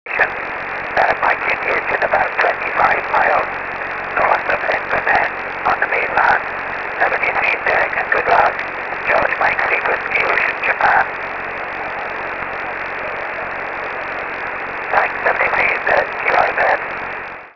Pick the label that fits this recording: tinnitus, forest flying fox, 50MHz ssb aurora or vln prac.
50MHz ssb aurora